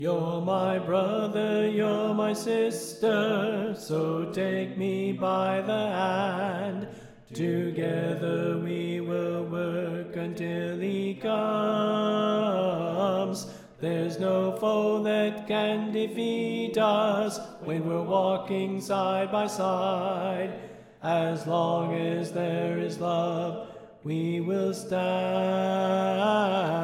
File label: Soprano Left